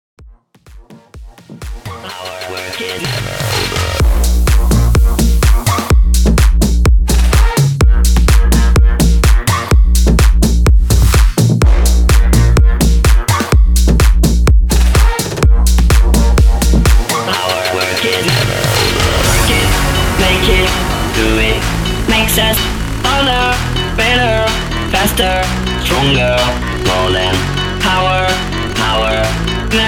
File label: MASHUP 126